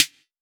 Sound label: good snare drum sound g sharp key; Duration 0:01; Samplerate 44100 Hz